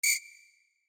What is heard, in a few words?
whistle